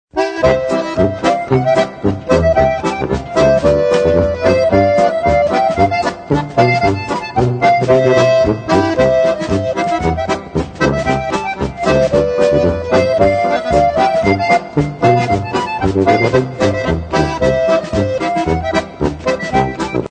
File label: concertina polka